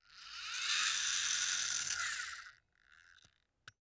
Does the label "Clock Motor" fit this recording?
Yes